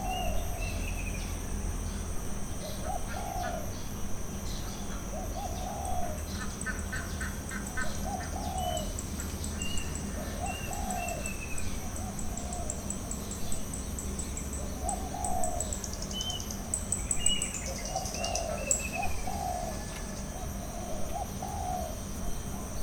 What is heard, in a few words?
Balinese Village Morning Loop; UltimateFishing2020